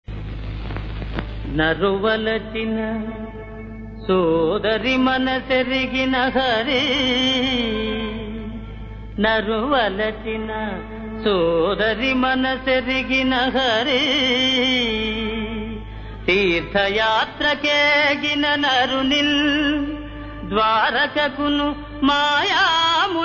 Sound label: Padyam; JayaSimha(1955); Naruvalachina